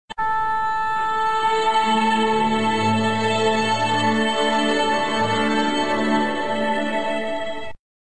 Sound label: Playstation3 Startup